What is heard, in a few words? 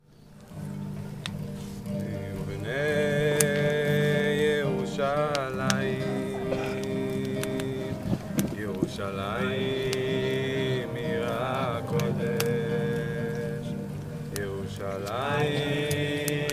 Chant For Peace